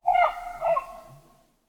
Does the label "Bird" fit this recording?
Yes